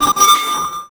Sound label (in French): effet de placage de bloc